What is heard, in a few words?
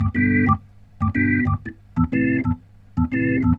Roots Reggae, B-3; Sounds Good